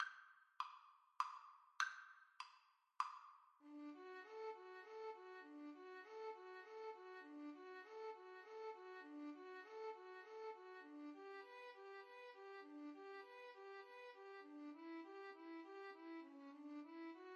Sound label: Violin Duet